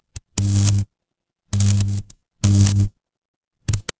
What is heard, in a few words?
fire electric arc